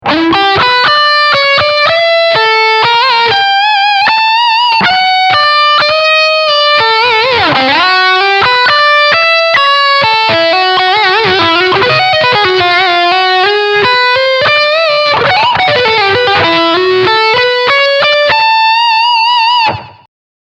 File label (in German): Lead Steg Humbucker